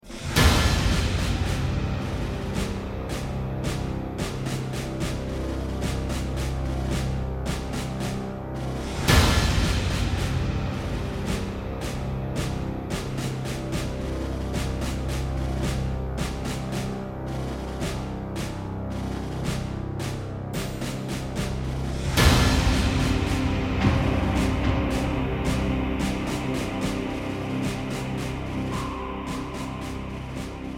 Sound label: Defeat; German